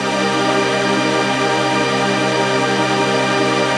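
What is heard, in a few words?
synthesizer; Sleep; Best Service Gigapack 1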